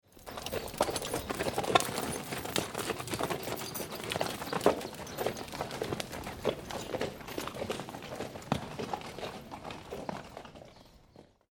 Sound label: Three Horses Walking By On Gravel, Horse hooves / horse hoofs clatter on dry gravel; as three horses walk past lazily